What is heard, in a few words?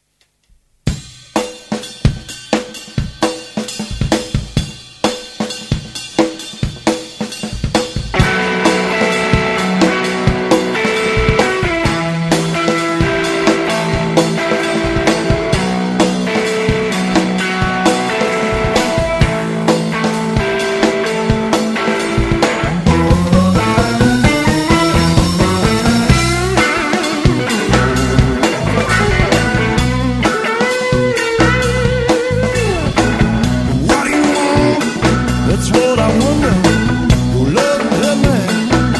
blues